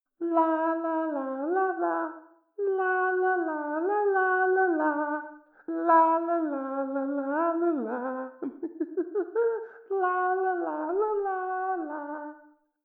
lalala; don t be afraid the first toy

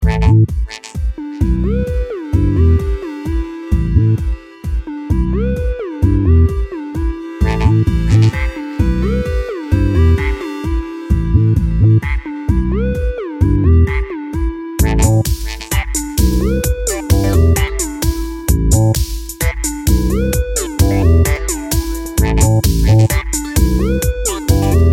Funky Frogstep; Frogger Hyper Arcade Edition

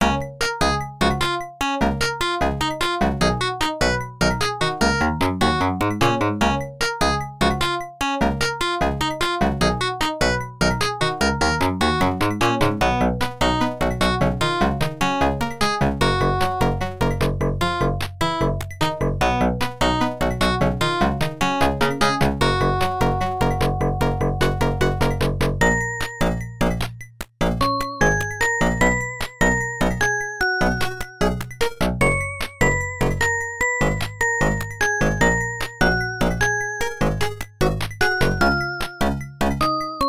Stage BGM

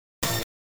pickup tone

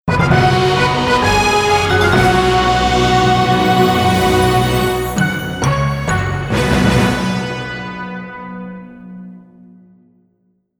Time Piece Get Jingle; Death Wish

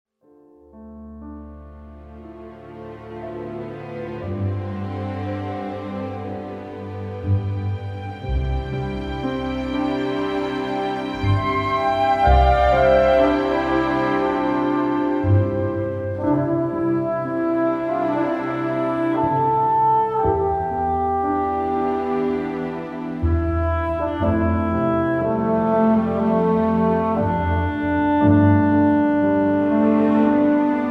Field BGM; Xenoblade Chronicles 3

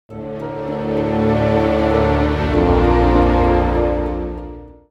Siege Defeat; heroes of might magic 3

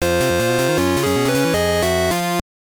Jingle; Sgt. Rock On the Front Line; GBC

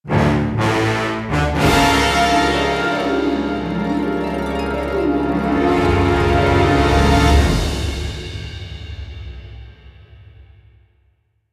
MUSIC STING; APOKOLIPS; Lego DC Super Villains 2018 Nintendo Switch

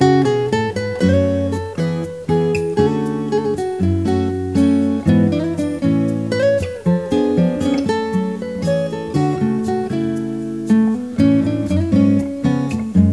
BEACH BGM; Kid Pix Studio Deluxe

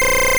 Leer; Pokemon; Gen 2; attack moves; GSC

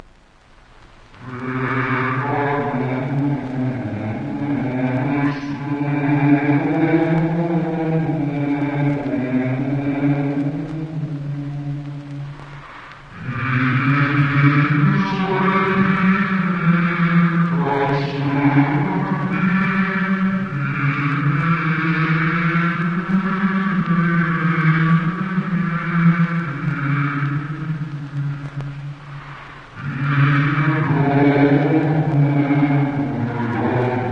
Imp Chanting

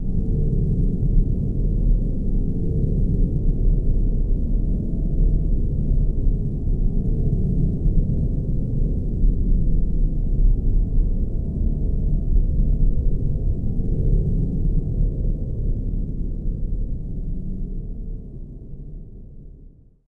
Launch Tone Amb; sid meier s civilization revolution 2 2015 vita

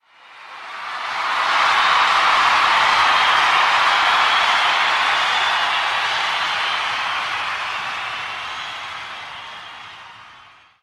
Crowd Cheer

